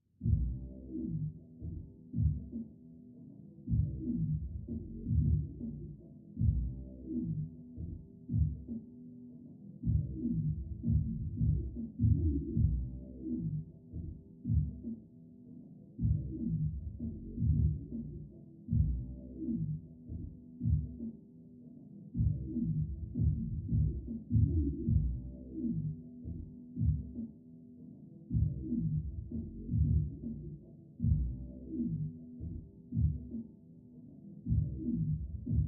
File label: Mx Hip Hop Beat